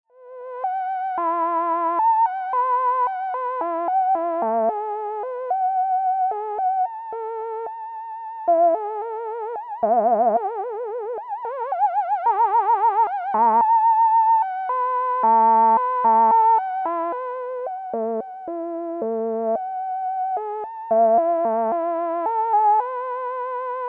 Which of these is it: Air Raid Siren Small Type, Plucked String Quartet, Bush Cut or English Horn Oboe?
English Horn Oboe